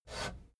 fader plastic scrape single up halfway medium

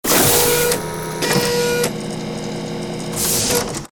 servo action style

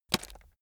chocolates take short

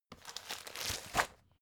folder file jacket turn hard

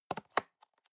plastic box food small close soft

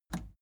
plastic button scratch smooth release enable

